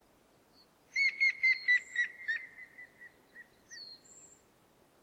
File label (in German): grauspecht